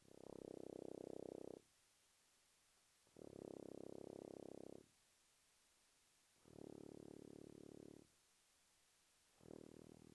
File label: Tuba pp